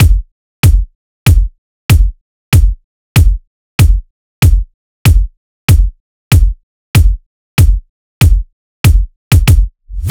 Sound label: Drum Loop 95 BPM Kick, Hip Hop; Drum Loops; Loop Stems; Uno